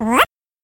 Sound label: monster click